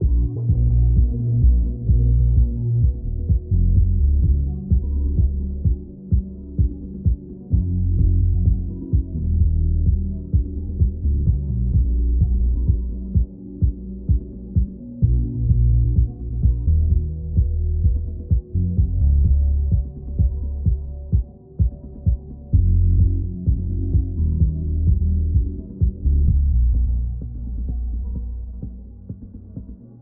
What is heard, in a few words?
music; Junkyard